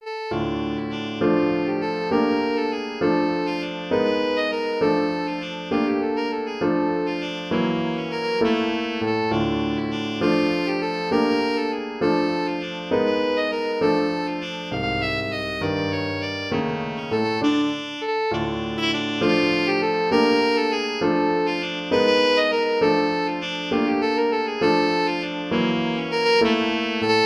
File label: saxophone